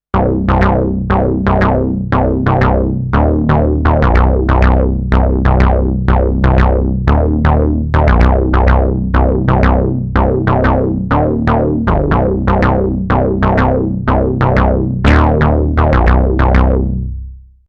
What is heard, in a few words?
microbrute